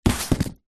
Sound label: container with dry rice inside set down on wooden counter; zapsplat; foley